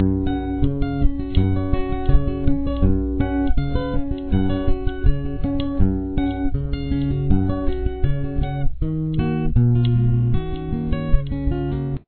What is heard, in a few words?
chorus, guitar